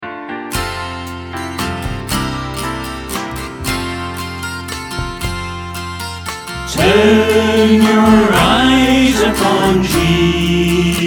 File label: choir